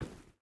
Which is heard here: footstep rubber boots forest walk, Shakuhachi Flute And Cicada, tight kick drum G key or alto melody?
footstep rubber boots forest walk